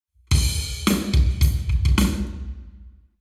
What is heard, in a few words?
Hall Drums 0deg; eMagLS